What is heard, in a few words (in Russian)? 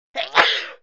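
чихание женское взрослое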